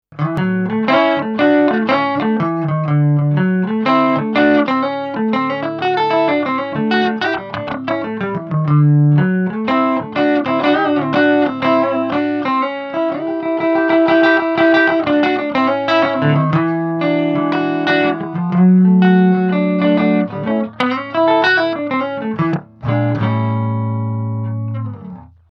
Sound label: Maxon AD9 Ambience Delay Effect